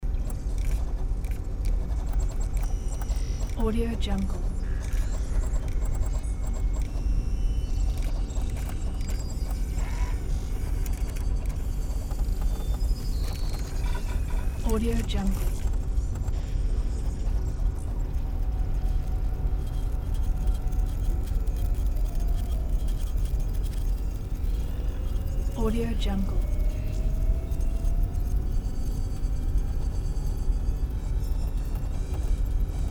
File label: ambience computerroom